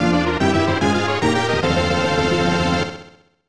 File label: Skill Item, snes; Estpolis Denki 2; Lufia 2 Rise of the Sinistrals